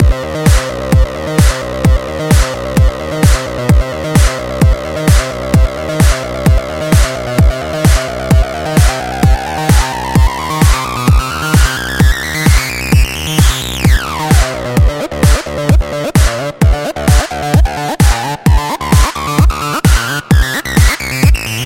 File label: MASSIVE BASS ACID 130 BPM